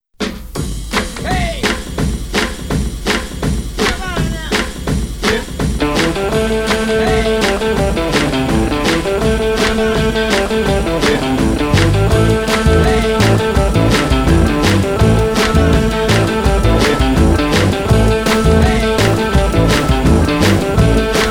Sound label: funk a declic